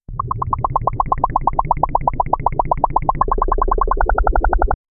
underwater bubble sound

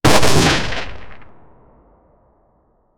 sudden gun shot; weapons

